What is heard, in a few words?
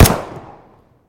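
practice shot at a shooting range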